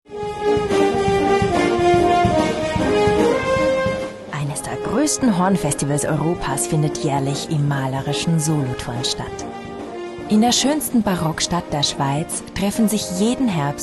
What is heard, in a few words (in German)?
Solothurner Horntage